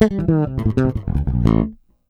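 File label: FIERCE FILL, Bass, 158 BPM; Sampleheads; Ultra Freakin' Bass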